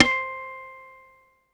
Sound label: c5, Guitar Steel, MIRAGE; ProSamples vol.55 - Retro Sampler